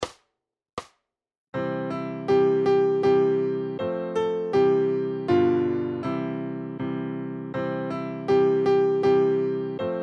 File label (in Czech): SuperKlavírKoledy; Veselé vánoční hody; Super Snadný Klavír: Koledy pro samouky a začátečníky